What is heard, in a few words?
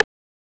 rim